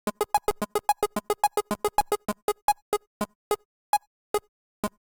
DICE Electronic B, Roll; Samples; ArticulatedSounds; DICE Sfx Party (Extended)

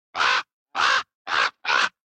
Red Bird; Blind Quest - The Frost Demon

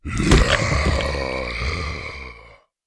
zombie hit

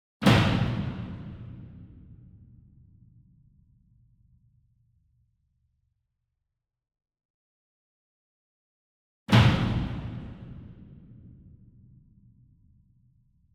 SINGLE DRUM Verdi 66 Inch Flam Hard Beater Far; boom library; Cinematic Strikes Construction Kit

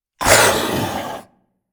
Zomby Stressed; Blind Survivor

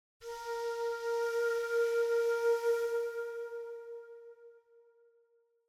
Flute Tone - Even; Hollywood Edge; sound designer's toolkit v2

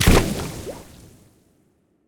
Water Tank Footstep; AudioWizards

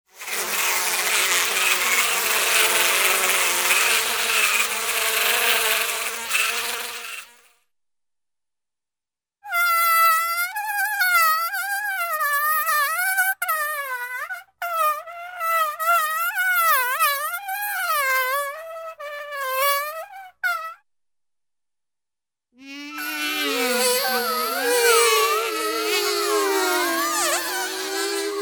Bees Insects Buzzing, Cartoon; Hollywood Edge; Cartoon Trax

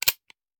Hand Gun Foley; Sound Ideas; Guns

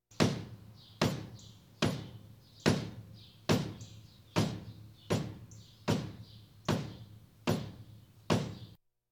Police, Fire engines

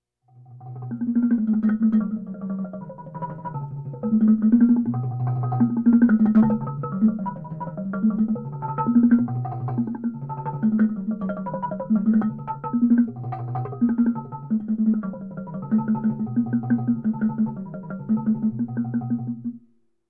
Percussion Bongos Tympani rolls Gongs Drums; Valentino Production Sound Effects Library CD50

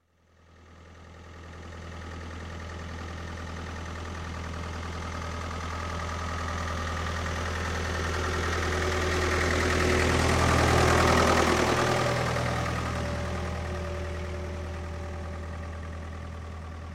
Tractor, Diesel Pass By, Slow, Industry, Farm; Sound Ideas 2000 Series

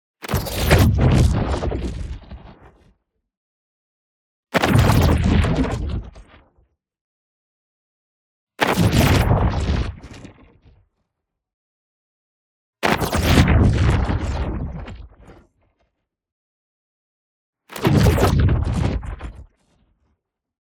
ABILITY DEACTIVATE Choppy Warp Glitch; boom library; Matter

